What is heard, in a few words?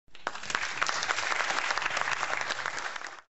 win applause